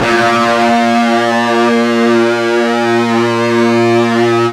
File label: FUZZ A#1, ULTRA FUZZ, Guitar; East West; Steve Stevens Guitar Samples Collection